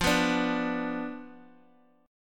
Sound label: B Diminished F, guitar